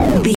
retro impact hit

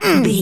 voice male grunt pain

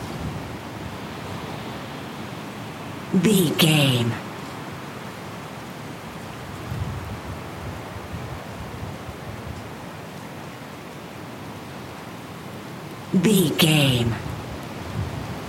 wind general gusty high loop

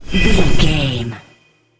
magic flame of light

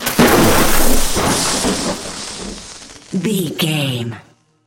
elec lightning magic spell

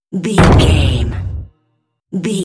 cinematic drum hit trailer

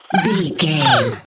goblin fairy effort push fast

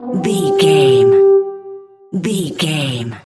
battle viking horn call far

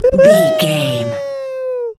voice fun character flutter man

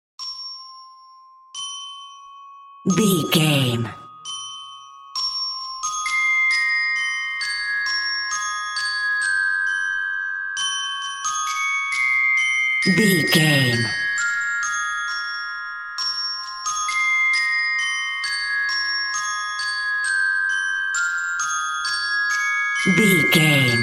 glockenspiel; Rock A Bye Baby Glock

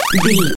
retro collect pickup item

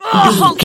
voice female hurt pain choke

